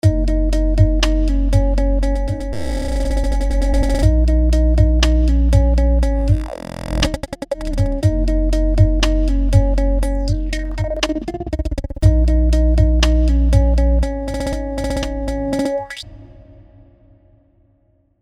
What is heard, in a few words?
iZotope Stutter Edit 2 Master Bus Fills And Buildups